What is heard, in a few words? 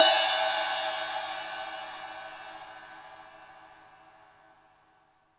real drum kit; REAL LIVE KITS; A Real Freakin Drum Kit